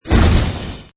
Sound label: grenade; Collection Synesthésie; Isabelle Grosse